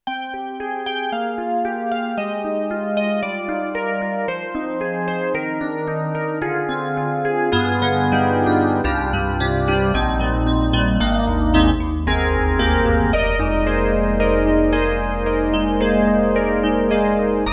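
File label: SD-80 Chorus Electric Piano; EDIROL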